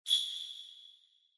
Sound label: Ring Bells Reverb Knock